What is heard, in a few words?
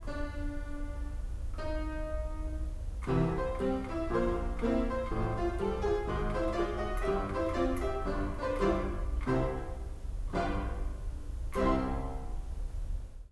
piano